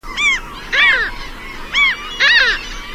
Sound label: larus melanocephalus